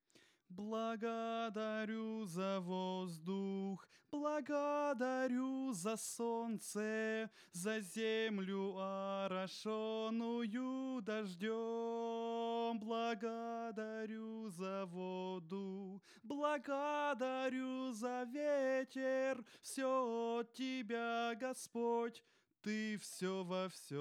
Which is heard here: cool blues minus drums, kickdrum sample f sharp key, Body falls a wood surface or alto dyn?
alto dyn